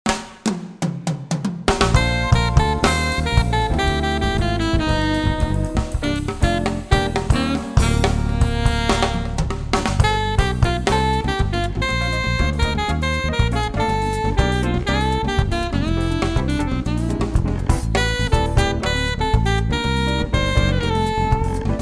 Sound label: samba